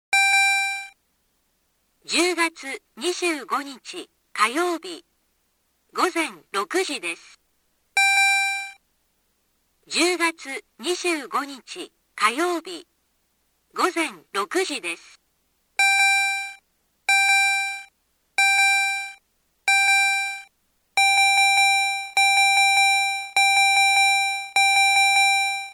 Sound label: Alarm